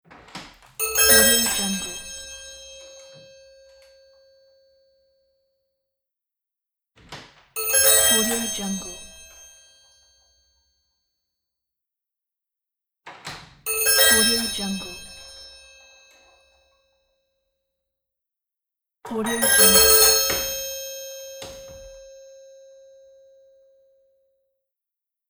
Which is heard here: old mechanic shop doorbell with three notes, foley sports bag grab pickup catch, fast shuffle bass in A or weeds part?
old mechanic shop doorbell with three notes